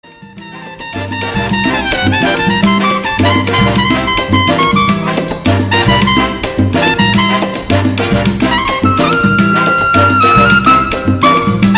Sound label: Tango Rumba